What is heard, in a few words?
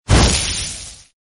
Fire Baki Ignite